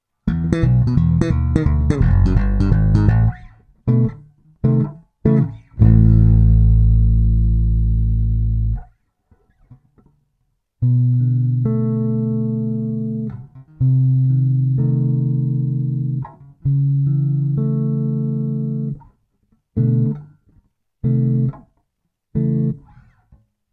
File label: ending, ending for bass